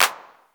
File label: TR909 CLAP; East West - Global Sounds